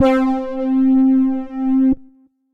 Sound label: Brass